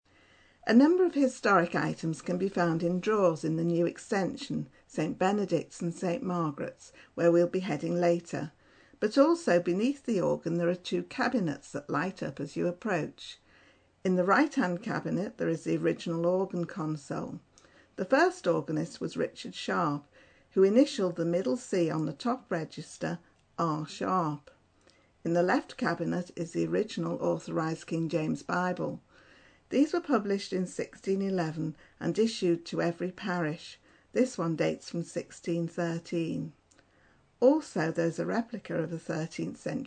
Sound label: below the organ